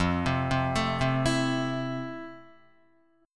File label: F Major 7th Guitar; arpeggiated; JGuitar